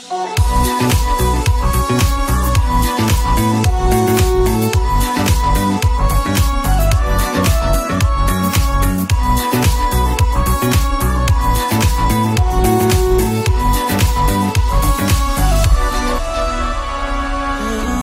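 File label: music version ringtone, bollywood ringtones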